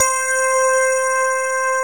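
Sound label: grand piano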